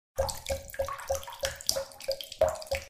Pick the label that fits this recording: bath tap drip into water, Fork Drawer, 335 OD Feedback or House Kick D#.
bath tap drip into water